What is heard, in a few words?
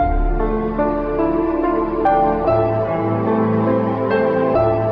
piano, Loops Sad